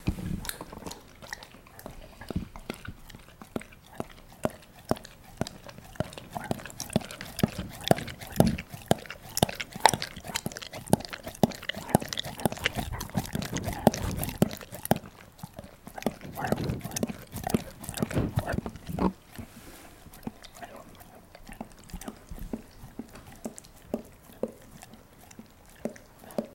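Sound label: Coon Hound Dog Licking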